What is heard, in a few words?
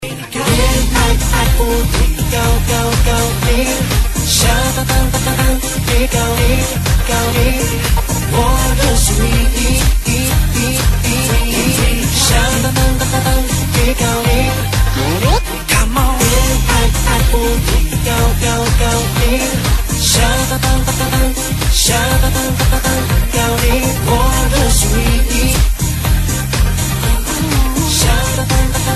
Funky House Remix